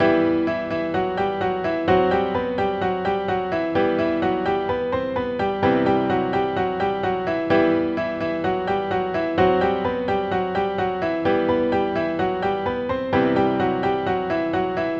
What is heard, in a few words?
Dark Piano Melody 128bpm